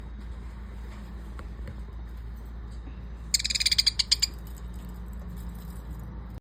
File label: angry bird squawking